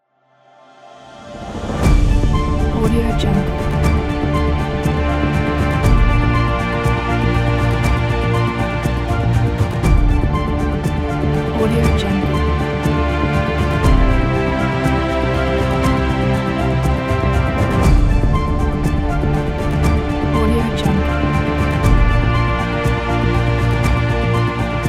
epic cinematic intro

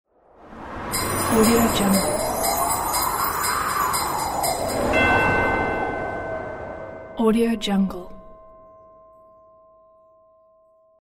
christmas whoosh; archimusic

